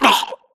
grunt death neck; Titanfall 2

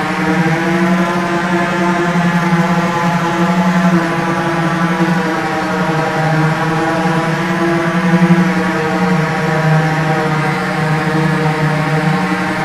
blue turbine loop close; Titanfall 2

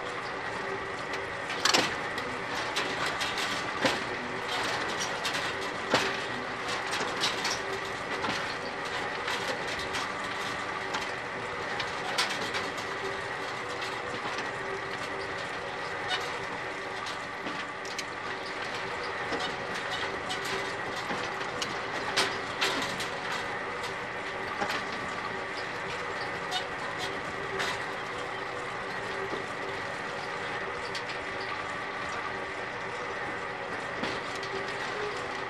backwater windmill; Titanfall 2

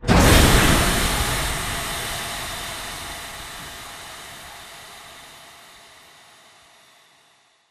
amb steam shutoff; outpost207; Titanfall 2

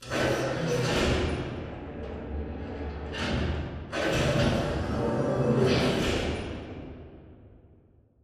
Robot Arm Pass Right Pickup Dist; Boomtown; Titanfall 2

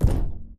Footstep Jog Far; Titanfall 2

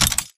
Mvmt FS Walk; AI Tick; Titanfall 2

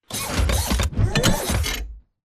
combat run turn; Titanfall 2

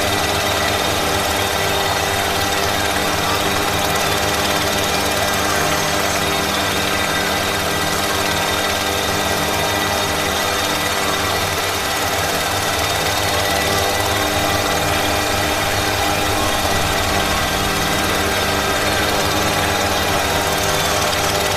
Worker Drone Hover; Titanfall 2

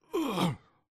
wall slide grunt; Titanfall 2

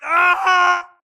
grunt death crush; Titanfall 2

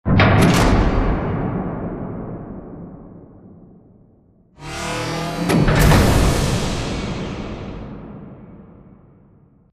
Giant Floor Panel Assemble Final Lift Mech; Boomtown; Sideways Town; Titanfall 2

